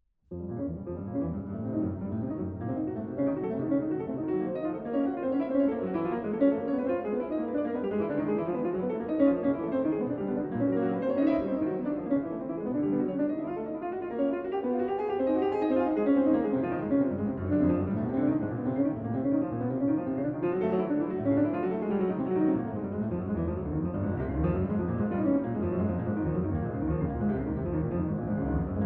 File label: Piano Sonata No 2 In B Flat Minor, Finale Presto; Frederic Chopin; Op 35; Funeral March